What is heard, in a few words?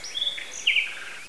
Empty land; Caesar III